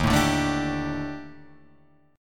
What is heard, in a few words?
Eb Major 7th Flat 5th, Guitar, Drop D; EbM7b5; strummed; JGuitar